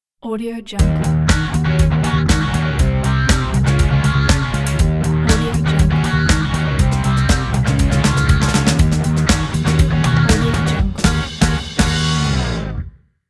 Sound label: intro funk logo